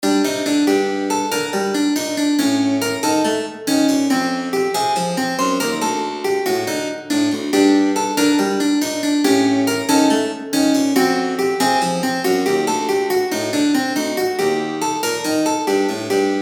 tango

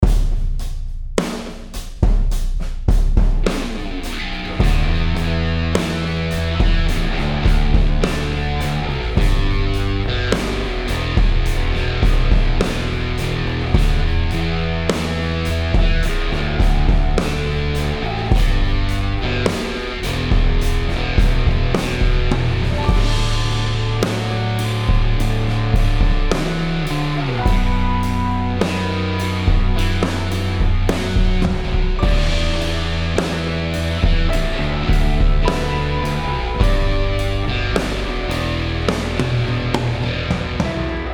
truck drums

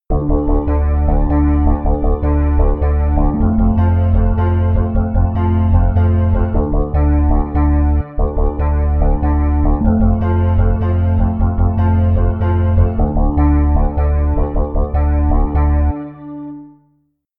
bass

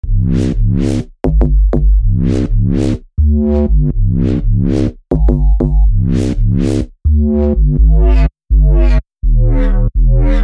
bass loop

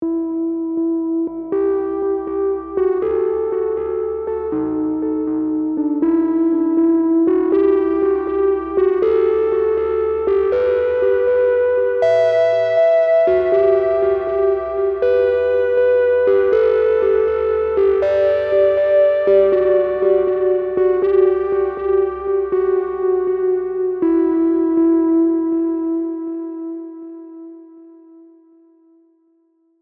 sine drops

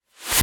c02 shooter gun shot reverse

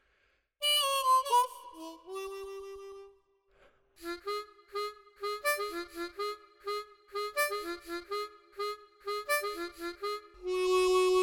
harmonica; ruthles

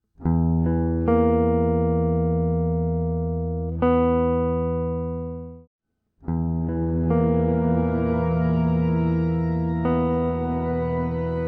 dark strings rising

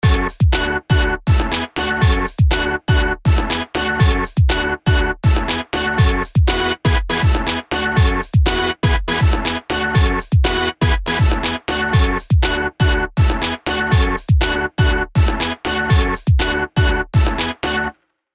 melody dry

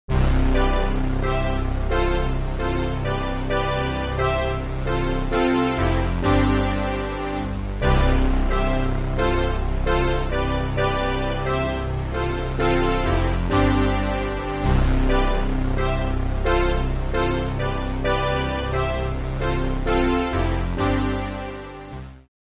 synth brass

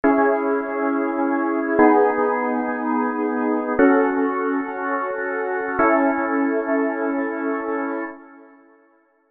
serum pads; chiqui audio

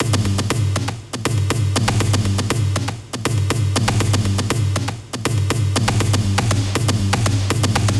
machine tribes loop main hits

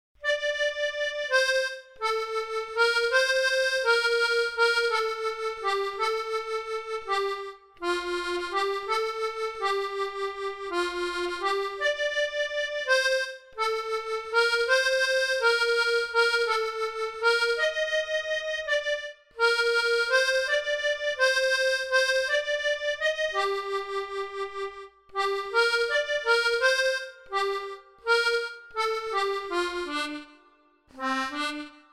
accordion

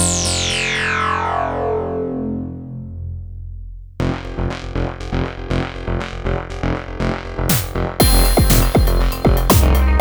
filters in series

